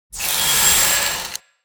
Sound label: Metallic Jitter; TransitionsFX; НАБОР DSLR КИНЕМАТОГРАФИСТА